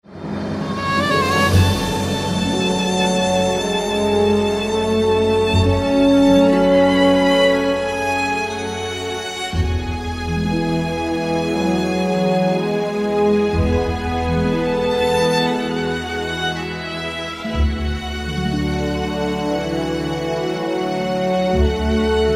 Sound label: Light Ringtone